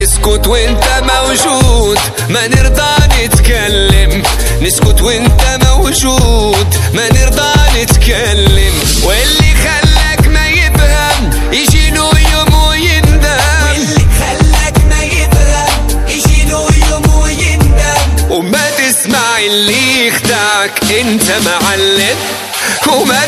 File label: arabic ringtone